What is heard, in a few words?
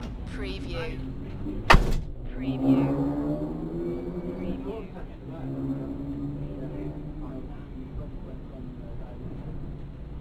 Rally pit ambience and chatter; beanstalk audio